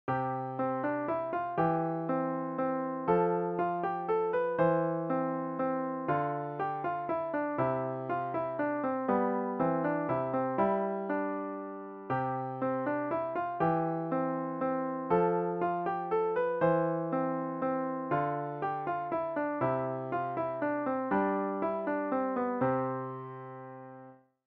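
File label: Bach Minuet in G, piano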